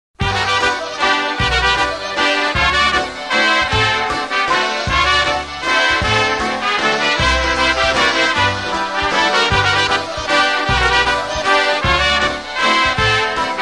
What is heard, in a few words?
Waltz